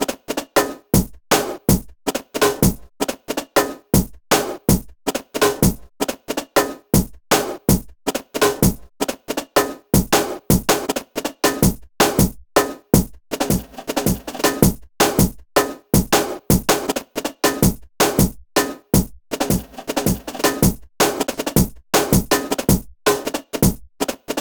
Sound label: break remake